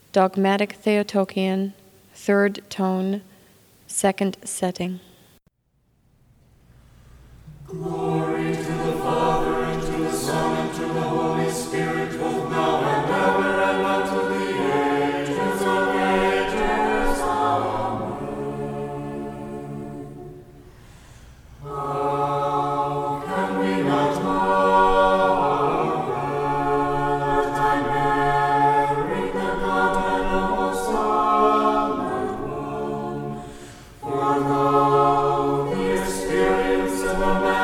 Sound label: Dogmatic Theotokion Tone 2; Setting 2